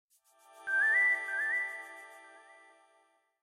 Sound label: Vivo S1 Pro Stock Notification; Doremi